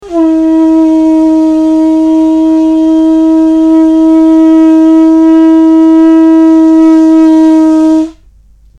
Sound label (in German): muschelhorn